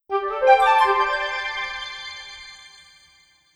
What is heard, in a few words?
enchant creep; weapons; dota; enchantress